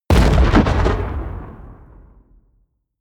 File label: bomb rumble close